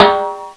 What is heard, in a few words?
timbale, percussion instruments; Sound Effects for Multimedia - Volume 1